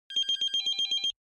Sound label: pager alarm, beeps